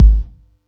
Yamaha EX-5

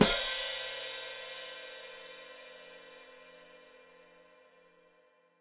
Hat Closed Open, Hi-Hat

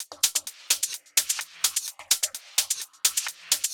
Drum Loop 128BPM Overheads; Loop Elements; VEC5 Drumloops; VEC5 Multi Loops 128BPM

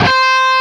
LEAD C 4; East West; Steve Stevens Guitar Samples Collection

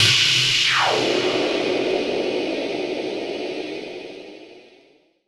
NOISE, Production Effects

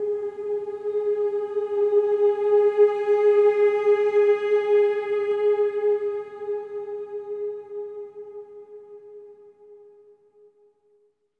G#4, Symphony of Voices, SWELL; SWELL MMS

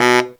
BARITONE FX; Zero-G; Phantom Horns

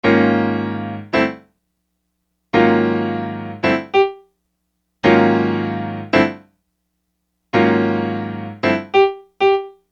Acoustic Piano

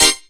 Orch Hit Cmin7; Proteus 2000; Digital Sound Factory Proteus 2000

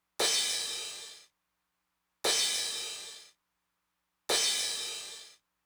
Crash, Roland TR-909; Roland TR-909 Ableton Project

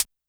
HH Cl Ana, YAMAHA RM1X

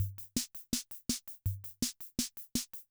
Panasonic RD-9844 Loop; Panasonic RD-9844 Sample Pack

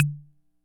Ace Tone Rhythm Fever Perc, Drum Machines; 300 Drum Machines

